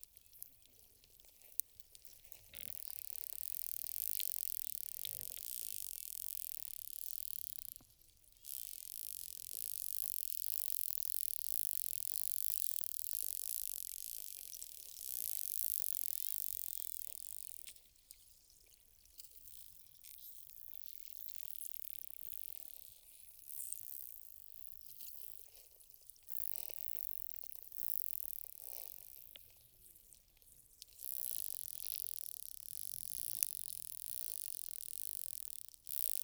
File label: Tursiops truncatus; Nicky; SHARKD; SABIOD